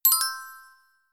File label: notification; samsung Aleart